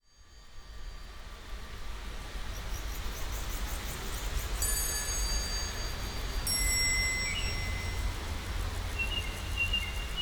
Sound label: Birds Sounds